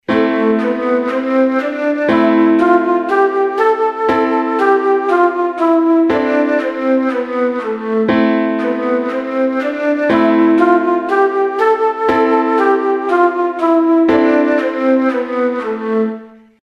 Am natürliche Molltonleiter

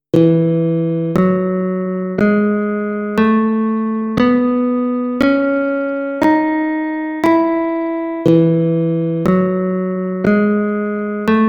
E Dur Tonleitern fuer Gitarre

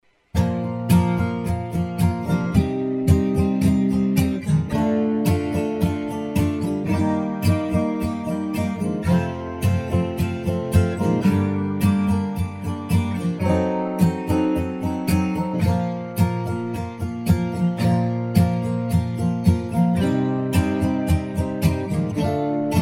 Bb Ais Dur Akkorde

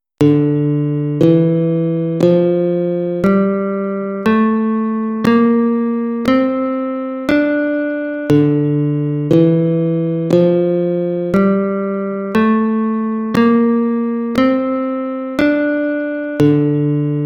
D Tonleitern natuerliches Moll aeolisch

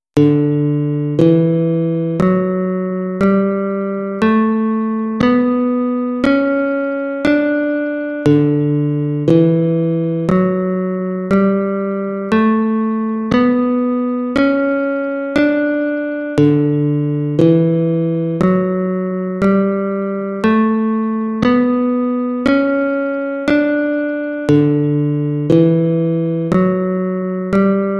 D Dur Tonleitern fuer Gitarre

